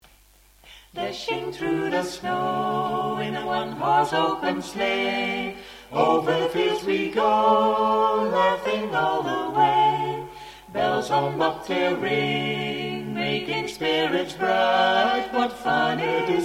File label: jingle bells